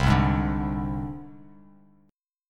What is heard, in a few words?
C Suspended 2nd Sharp 5th, down, Bass Standard; Csus2#5 strummed; JGuitar